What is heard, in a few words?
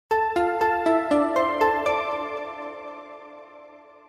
Best Message